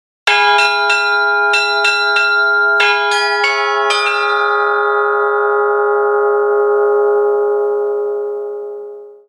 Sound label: Jingle Bells